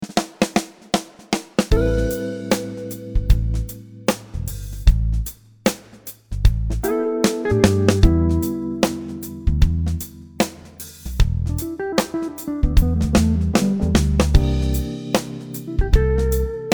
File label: Backbeat 76 Swat Swing